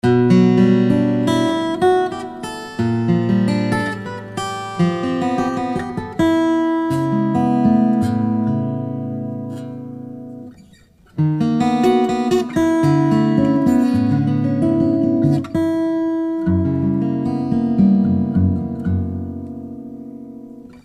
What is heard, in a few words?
jumbo, guitars